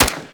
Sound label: SMG Shot B; Tenno; Sundial